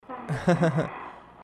risada hehe

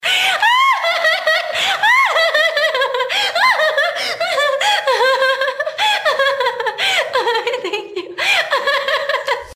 risada laugh

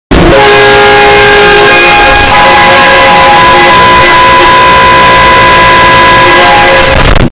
som buzina estourado

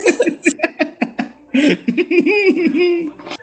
risada

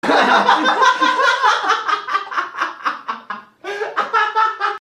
muita risadinha

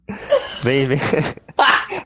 risada loka

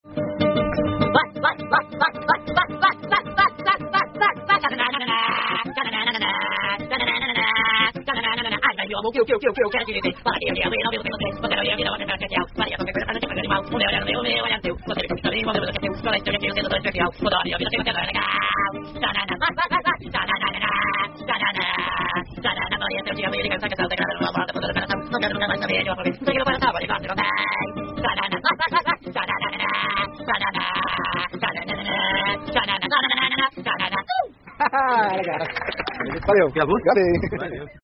tcha nana nananana

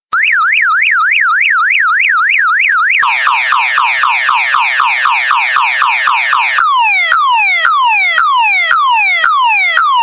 alarme de carro chato aquele mesmo

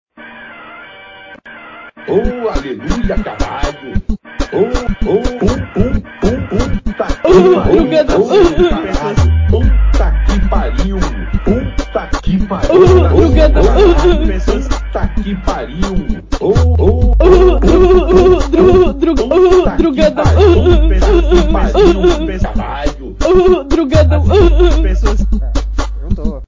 funk pistola

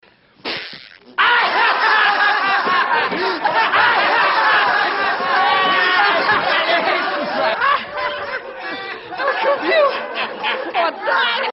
peido ahahahahaaha

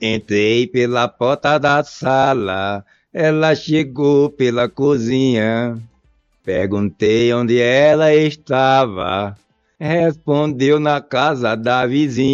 entrei pela porta da sala cantando

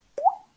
gota de agua

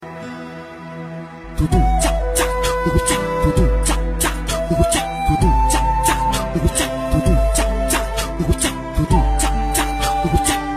funk musica da bad triste

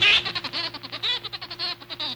risada; pica pau biruta sarro

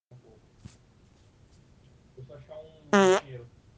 peido; capivara

